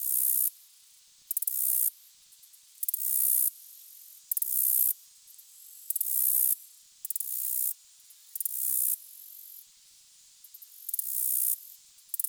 Single Cricket Loop; Ambience; Dagath; Warframe; Clan Dojo

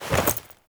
Move Foley Short; Characters; Warframe; The New War; Veso

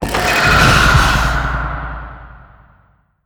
Emo Specter Flicker; Warframe; Harrow; Horror Stinger

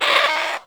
Bird Of Prey Vocal Scared

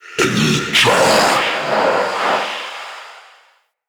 Male Vocal Charge Scream, Enemy; Mockery; Mockeries

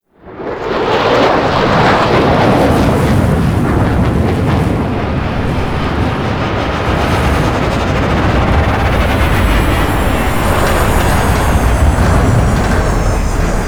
Grineer Ship Liset Relay Intro Docking; Vehicles; Warframe; Liset Flight

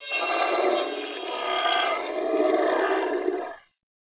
Sentient Symbilyst Idle, Barks, Enemies; Warframe

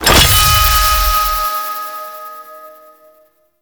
Give Offering; Warframe; Cetus; Cetus Invasion

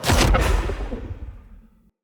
Great Sword Range Rune Smite

